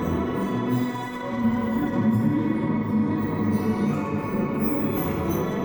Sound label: Tonal Re Draw, sparse soundscape